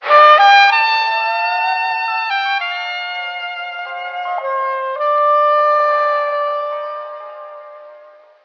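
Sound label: sax wistful, sad loop; loops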